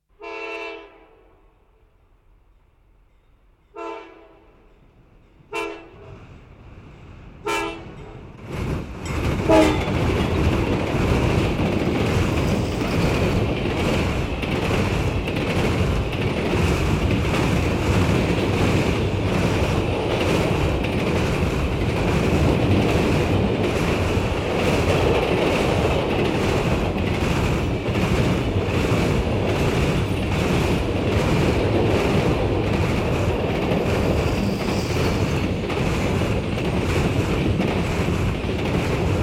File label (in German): hupend vorüberfahrender personalzug; Bahnhof